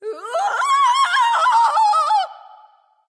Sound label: fear; smite; nox